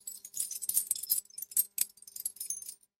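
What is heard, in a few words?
metal hoop melodic ringing; ukrasheniya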